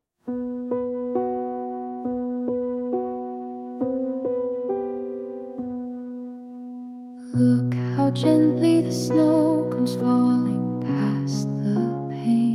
lullaby, name songs; nansi

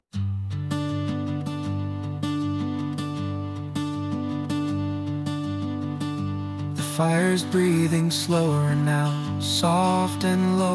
lullaby, name songs; kit

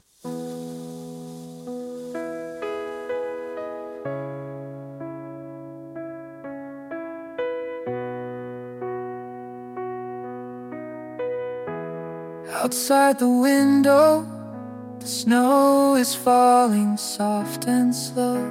lullaby, name songs; dominic

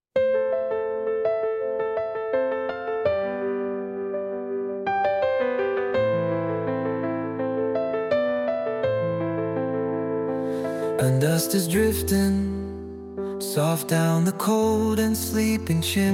lullaby, name songs; bryson

